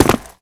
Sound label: land stone, footsteps